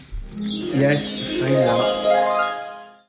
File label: fade up music